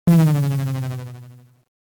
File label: 8bit Power Down